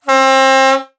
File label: Emergency Fire Truck Honk Air Horn; emergency effects; AdobeCC